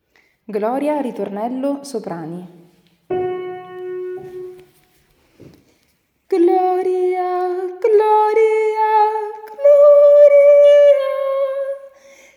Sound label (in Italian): GLORIA TAIZE SOPRANI